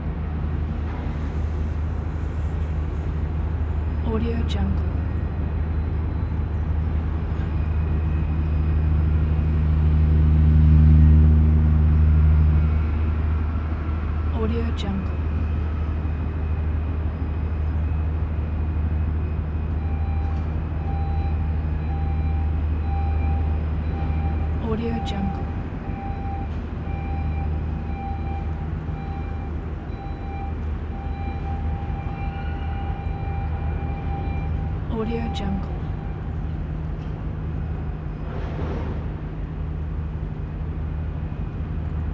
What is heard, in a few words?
container harbor ambience